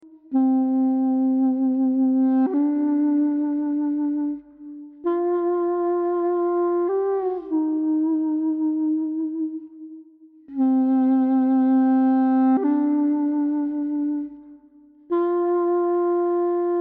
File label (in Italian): lento dolente solo